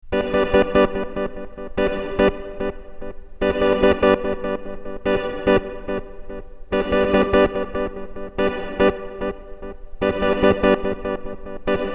delay reverb, Organ; delay time - 50ms to 500ms; repeat; reverb level; All recordings are direct line - instrument + OCTO-FX + audio interface = mp3-file

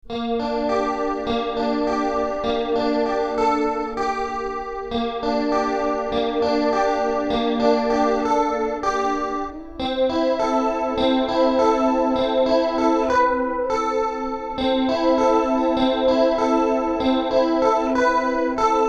Chorus Reverb; reverb level; chorus rate; All recordings are direct line - instrument + OCTO-FX + audio interface = mp3-file

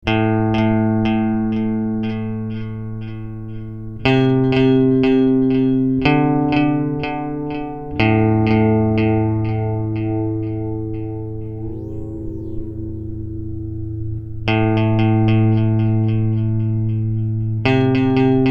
Ping-Pong delay; ping delay time; loop time; All recordings are direct line - instrument + OCTO-FX + audio interface = mp3-file

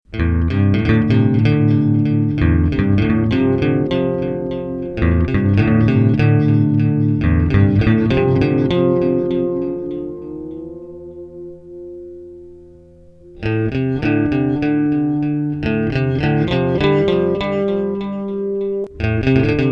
pitch delay; delay time; delay level; All recordings are direct line - instrument + OCTO-FX + audio interface = mp3-file; Organ

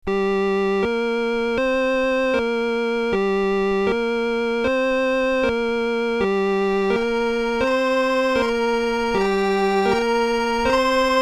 Octave Delay, Organ; octave up level; clean level; octave down level; All recordings are direct line - instrument + OCTO-FX + audio interface = mp3-file

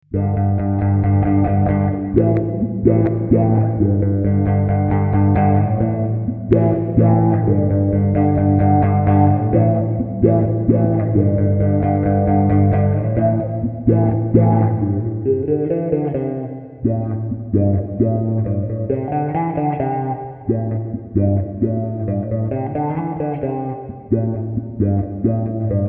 wah-wha + reverb; reverb level; wha-wha style; All recordings are direct line - instrument + OCTO-FX + audio interface = mp3-file

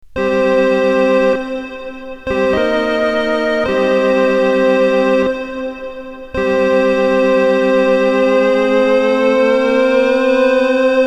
Octave Reverb, Organ; direct line - instrument + OCTO-FX + audio interface; reverb level; All recordings are direct line - instrument + OCTO-FX + audio interface = mp3-file

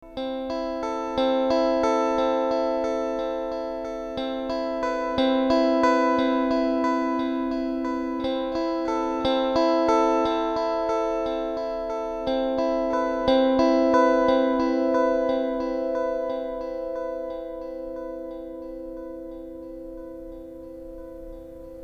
delay, delay - 50ms to 1sec; delay time; loop time; delay level; All recordings are direct line - instrument + OCTO-FX + audio interface = mp3-file

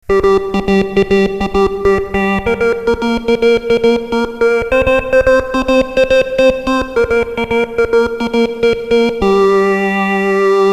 Phaser, Organ; direct line - instrument + OCTO-FX + audio interface; reverb level; All recordings are direct line - instrument + OCTO-FX + audio interface = mp3-file

